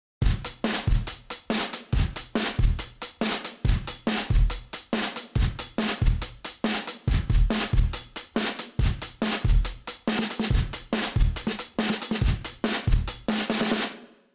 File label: neg ratio mpressor; elysia